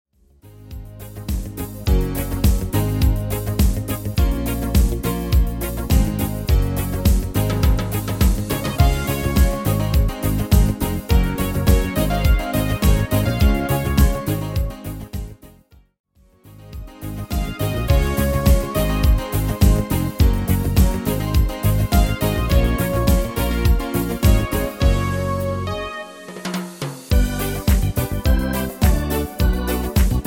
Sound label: Disco - Reggae 90